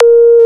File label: EERIE, INDUSTRIAL